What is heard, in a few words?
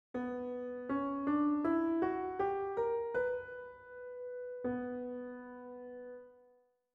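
B minor scale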